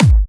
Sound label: Trance Kicks; Vengeance - Essential Clubsounds Vol.5; Bassdrums